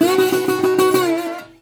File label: 120, Blues Guitar; ILIO - Fretworks